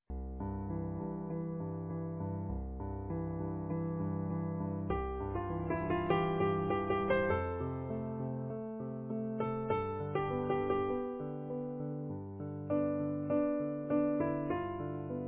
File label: Easy Piano